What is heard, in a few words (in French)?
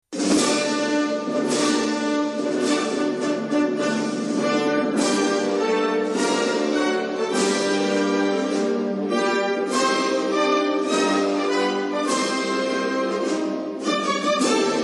hymne turquie